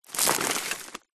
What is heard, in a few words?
foley foot shoe stand on old rotten wooden trunk small; zapsplat